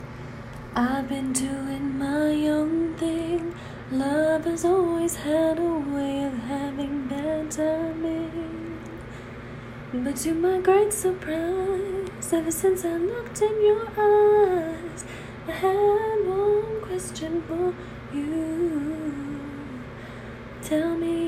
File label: Face mask karaoke